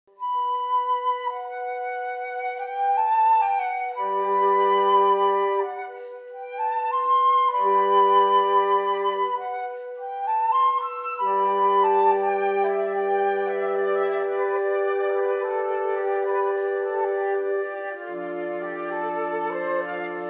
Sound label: oratorio de noel prelude; Multisono